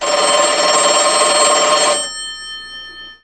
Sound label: PHONE, 电话; 特酷声音素材库